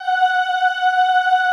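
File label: OOH F#4 right, classical choir; Best Service; Extended Classical Choir; OOH MIX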